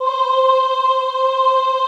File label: OHH C4, Classical Choir; Best Service; Extended Classical Choir